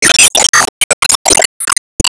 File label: Se Enemy Ufoblock Combine